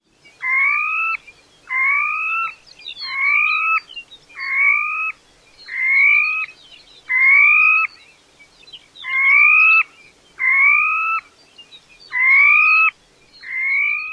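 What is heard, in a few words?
STISAN flight song num 1, stilt sandpiper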